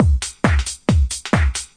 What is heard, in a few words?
135 bpm, tinny house hats, drum loops and breaks